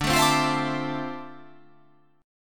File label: D Minor 9th, down